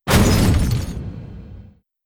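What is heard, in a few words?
gate death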